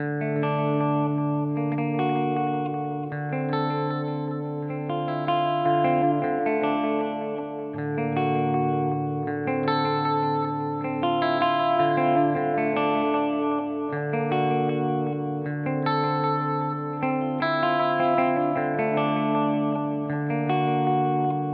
HX Stomp Delay Tape; Vergleichstest

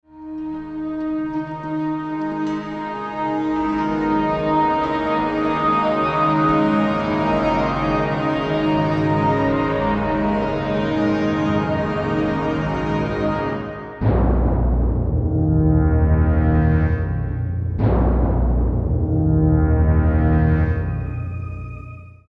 orch

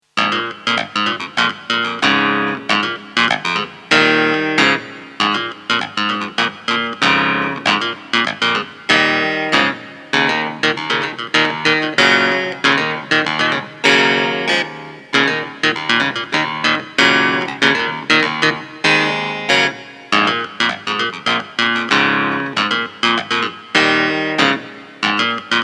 Hohner Clavinet D6 Brilliant

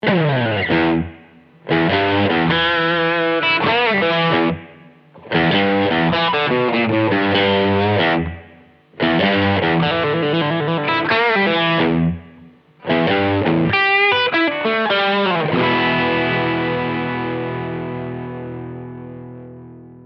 Full Gain Riff

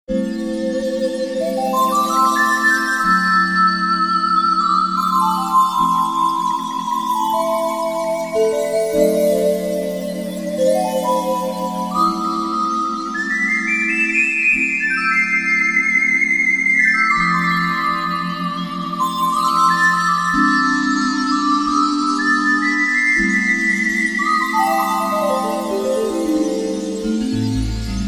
Korg T1 Soft Lead Layer

